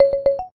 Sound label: htc vermillion